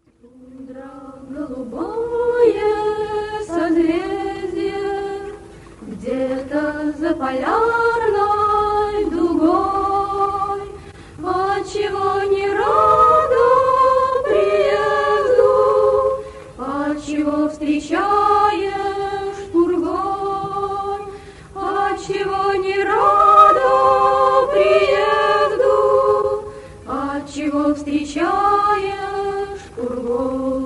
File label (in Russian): shkolnyiy vokalnyiy kvartet